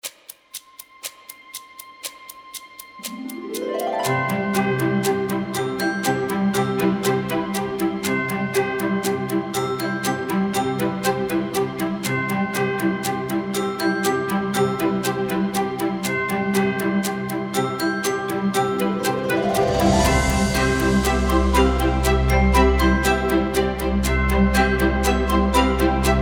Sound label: music